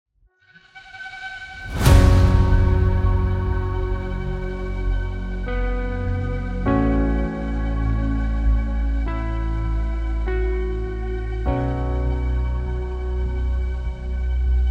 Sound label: Epic Hybrid Orchestral Trailer Music